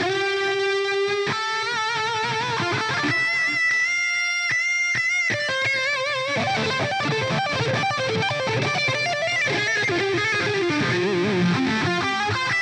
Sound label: Music guitar; Plants vs. Zombies Battle for Neighborville; GnomeGnight